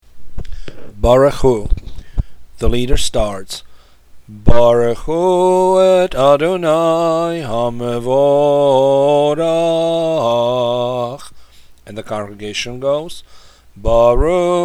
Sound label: barchu; shacharit shabbat